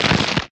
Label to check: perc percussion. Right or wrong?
Wrong